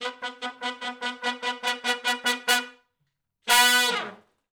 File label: Shuffle Blues Triplet Riff (B) unison, Horncraft for R&B; Sonic Foundry; Sony Creative Software; Sections